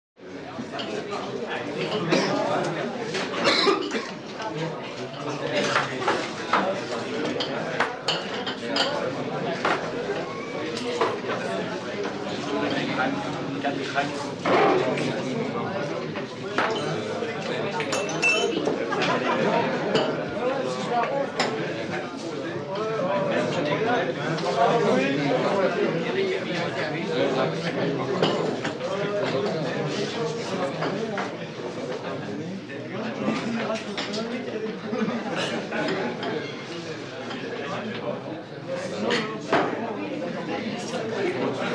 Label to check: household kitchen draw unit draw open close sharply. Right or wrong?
Wrong